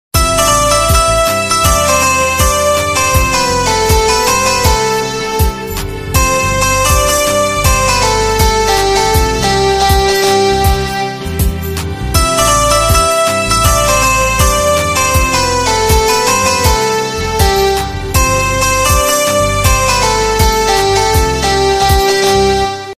song ringtone trending ringtone hindi ringtone instrumental ringtone; Animal